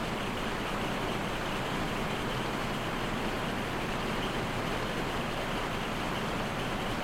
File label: Aircrafts Engines DB605 Prop; DCS